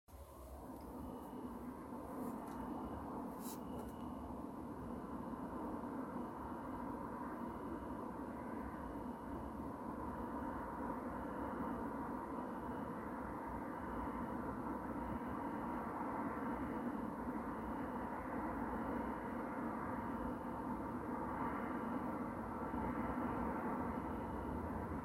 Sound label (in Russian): tihij fon nochnogo goroda